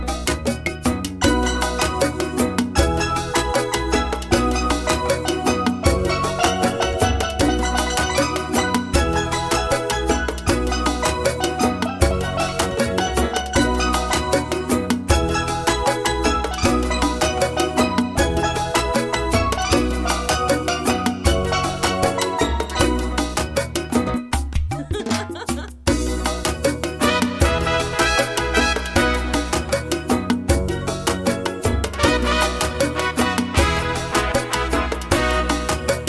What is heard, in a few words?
Laughing Calypso